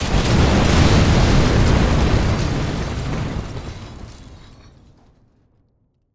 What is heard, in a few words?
Explosion With Debris; Fire and Explosions